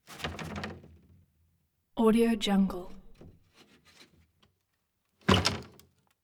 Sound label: glass door rattle jiggle shoved closed